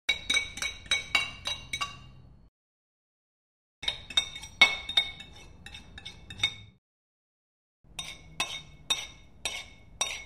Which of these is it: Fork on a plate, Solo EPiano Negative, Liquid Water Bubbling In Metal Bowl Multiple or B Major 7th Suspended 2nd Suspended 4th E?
Fork on a plate